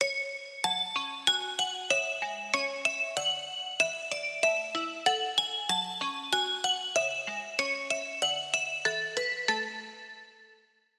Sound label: Bell; Routed Sound; Serum 2 Expansion - Story Walker Vol.1